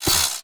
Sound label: drone strafe